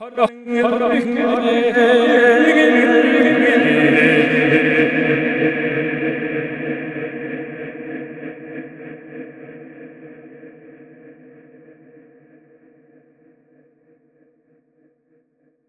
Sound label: MONGOL V FX, Asian Traditions; USB Soundscan vol.60; AKAI